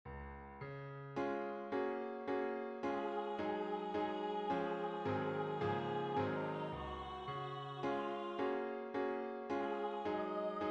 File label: tenor